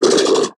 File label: recycler vox; Factorio